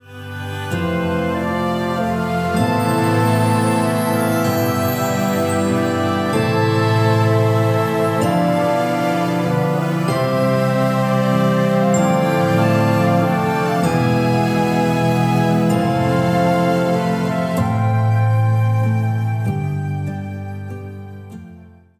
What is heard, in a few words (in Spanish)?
Tranqui; music for videogames